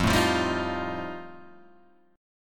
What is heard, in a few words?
F 9th F, chord